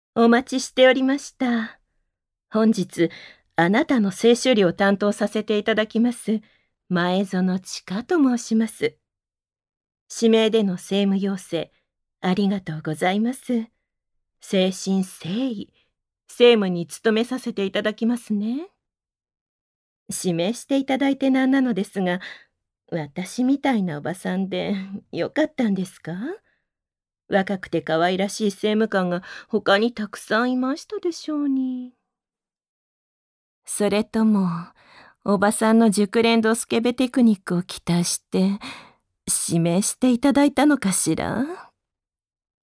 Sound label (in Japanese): ベロベロ乳首舐め手コキ